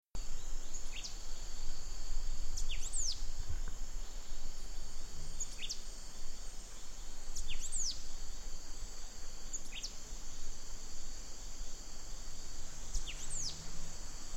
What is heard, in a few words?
rufous bellied euphonia